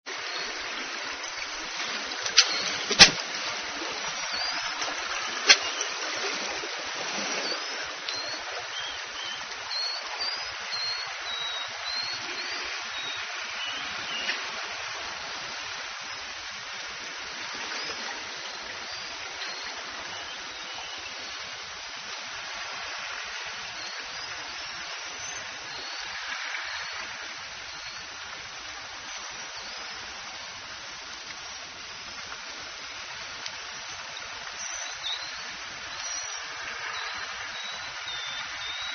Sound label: whistles, orcasound; JKL Victoria